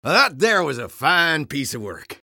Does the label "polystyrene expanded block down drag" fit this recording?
No